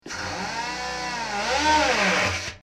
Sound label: Drill Inter Barrel, servos